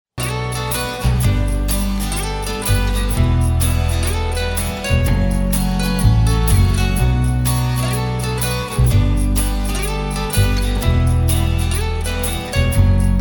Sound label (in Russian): Запись гитар